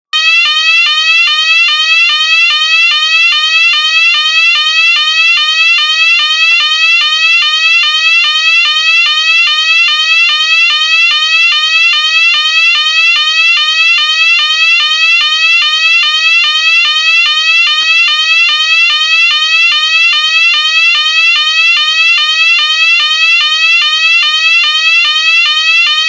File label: swept 600hz 700hz, sirene; DP3000